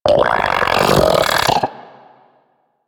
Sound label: Arachnoid Vocal Attack; Enemies; Vocals; Warframe; Corpus; Arachnoid Hunger